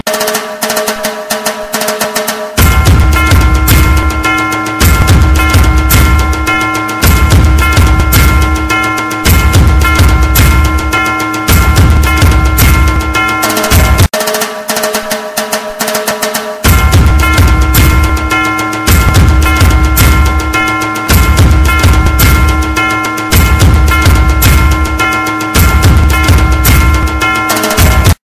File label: Ganpati Music